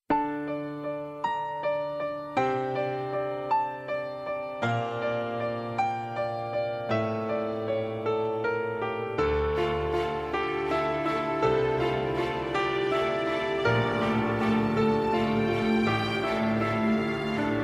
soft ringtone; samsung

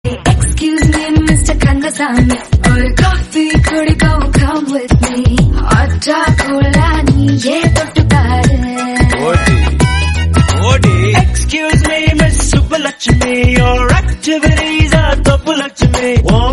srilankan ringtone

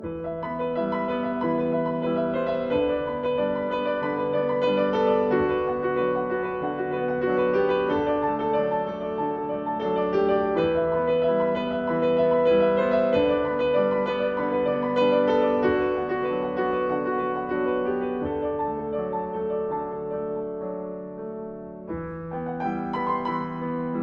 best piano music ringtone

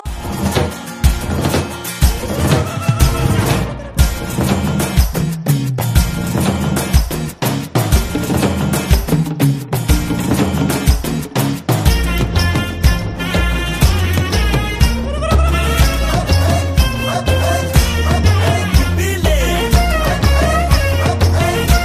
best telugu tune ringtone

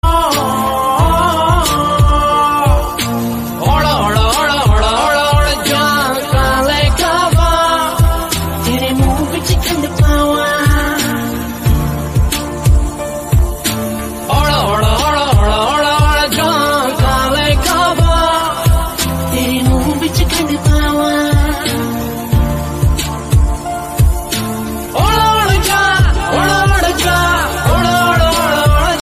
bollywood ringtone

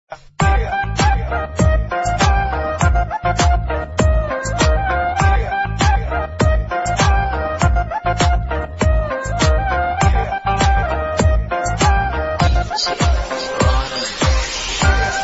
romantic love music ringtone

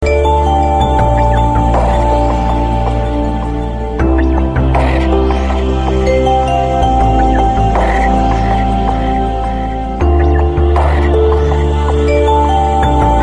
nice sad instrumental ringtone

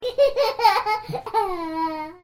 baby laugh notification ringtone

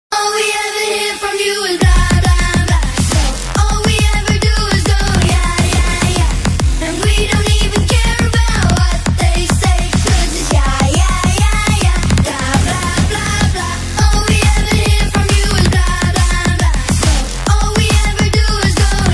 trance music ringtone

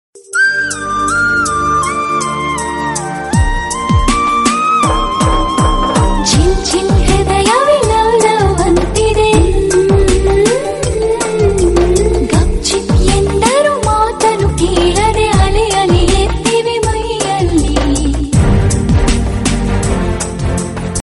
airtel tamil version ringtone

